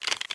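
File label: inspect movement, weapons; Valorant; ghost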